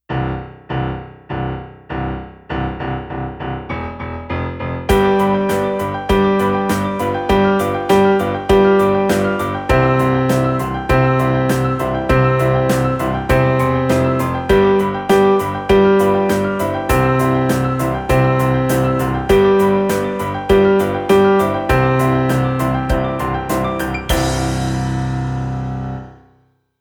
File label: Two Note March Slow